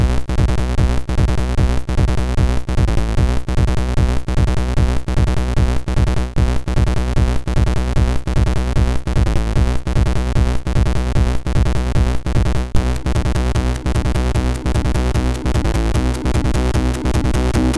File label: Loop, sid MOS6581